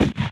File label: reel Bounce; netent; reelsteal